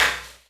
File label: Minimal Perc; Minimal Percussion